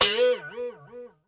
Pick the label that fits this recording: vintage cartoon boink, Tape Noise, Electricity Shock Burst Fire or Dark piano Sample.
vintage cartoon boink